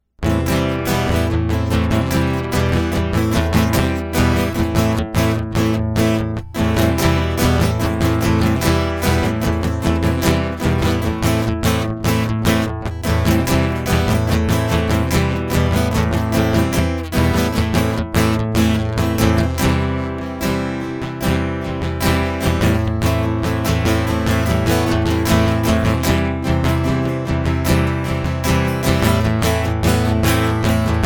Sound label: Guitar Loop